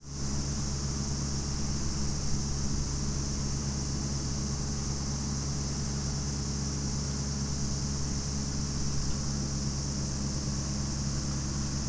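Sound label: pavement, parking, Fukushima Soundscape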